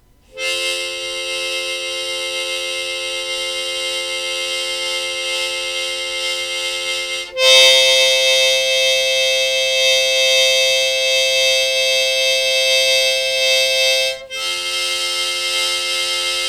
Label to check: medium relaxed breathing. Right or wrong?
Right